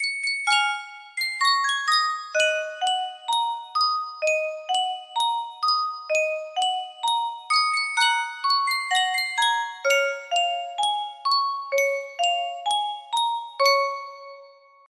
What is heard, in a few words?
yunsheng music box